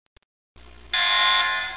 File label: ALARM; Talking E-Mail